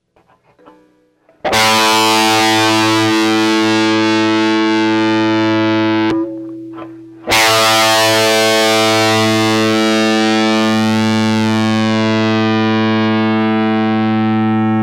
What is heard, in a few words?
GIT Distortion